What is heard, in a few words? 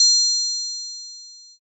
triangle